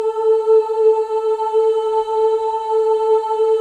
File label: OO A4, WOMEN; Spectrasonics; Symphony of Voices; WOMEN OOS